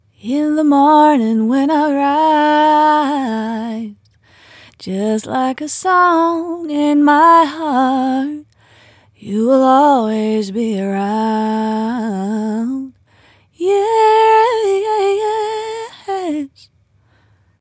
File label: Ballad Female Dry; se electronics z5600a ii